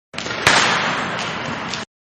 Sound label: Ice Hockey Slap shot isolated; Sports